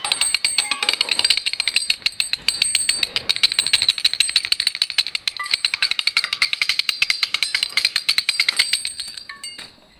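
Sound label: santas workshop on christmas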